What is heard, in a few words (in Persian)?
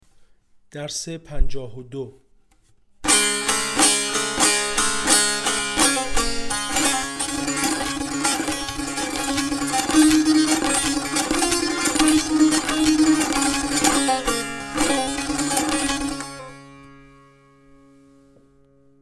مشق تنبور